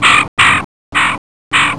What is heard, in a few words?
GRACKLE; ANIMALS